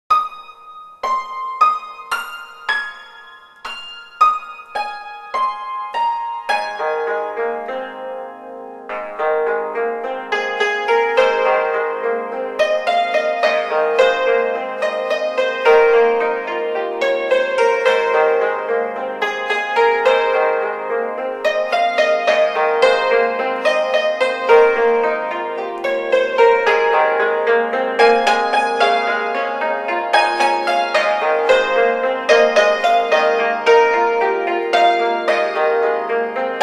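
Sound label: hymns and medleys on chinese hammered dulcimer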